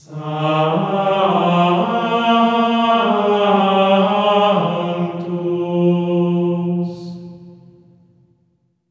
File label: SANCTU D#4; Spectrasonics; Symphony of Voices; GR SANCTU